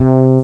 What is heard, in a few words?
Fretless 60; Amazon Trail